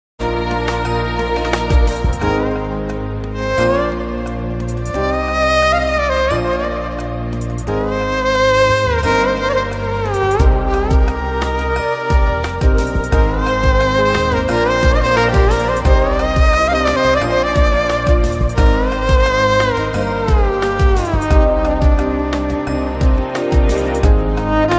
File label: tamil love ringtone; caron tunes